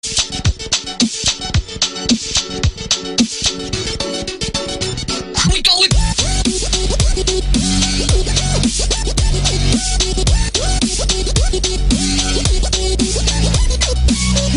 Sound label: Sickest beat